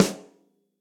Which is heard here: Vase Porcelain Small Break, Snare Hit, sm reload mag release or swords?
Snare Hit